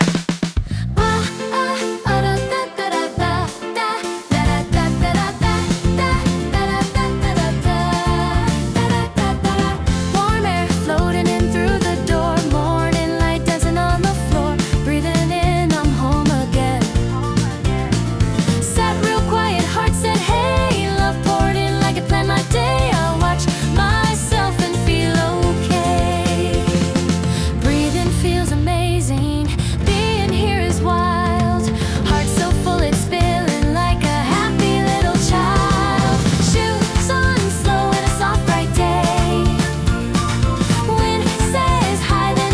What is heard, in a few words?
Breathing Feels Amazing